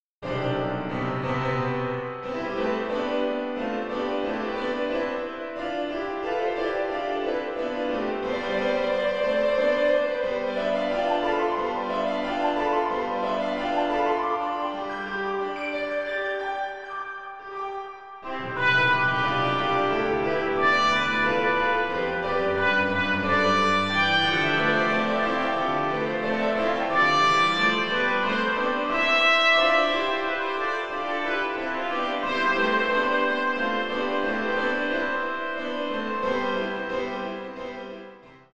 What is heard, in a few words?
Sonatina Trumpet Piano